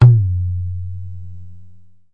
hard tabla Pressed Ge; ETHNIC and WORLD PERCUSSION; Tabla Kit Hard

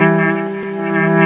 095 stringy reverso beat; STRINGS ORCHESTRAL CLASSICAL LOOPS

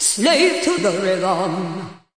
diva, Cheesy Divas, vocals

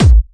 electro kick; Electro Kit; STYLE KITS (Hip Hop, Etc); Boy In A Band Electro Kit

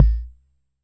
Jomox MBrane, drum machines; Jomox MBrane 11

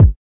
kik

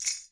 tamborine; Western and Latin Percussion; single hits

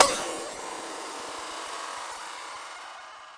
superstretch glass crash

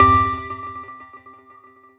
Synthesizer Middle C Notes, Lead

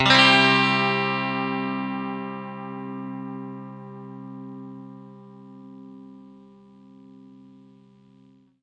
clean chord C, clean electric guitar chords

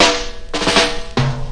reggae break, DRUM LOOPS and BREAKS; FILLS and ROLLS

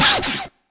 machine, Machine Electro Hits; STYLE KITS (Hip Hop, Etc)

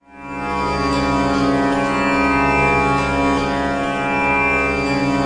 Puriya, Far East Drones and Plucks

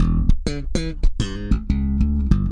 095 slap bass line

